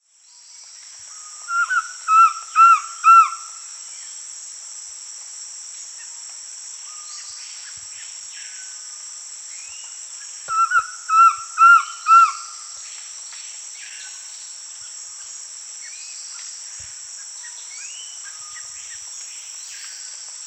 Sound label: Collared Trogon (Trogon collaris), Peru; S. America